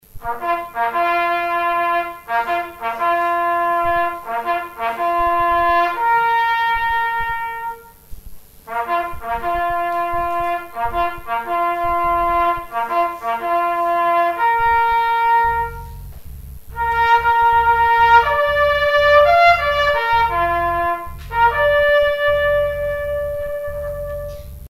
reveille; remembrance; The bugler then struck up the Reveille, ending the silence.

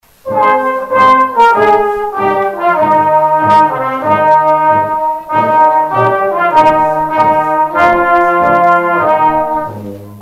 band march out colours; remembrance; The silver band then played as the colours departed the church.

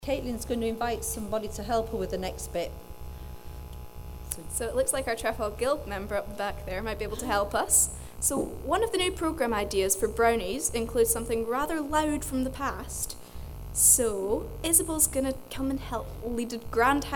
grand howl; Thinking Day

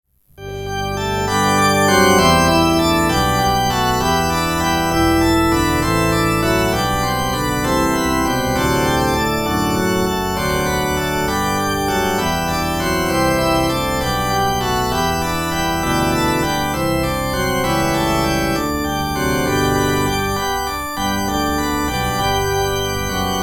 interlude; Offering interlude

